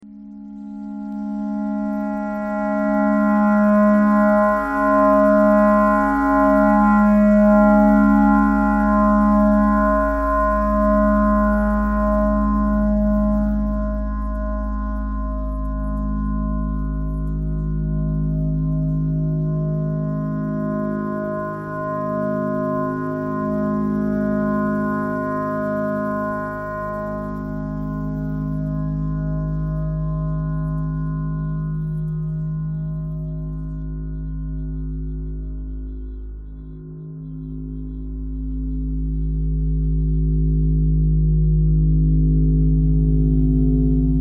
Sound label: clarinet clarinet simple all